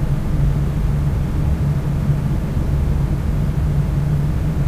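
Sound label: medium room tone ambience loop; ambient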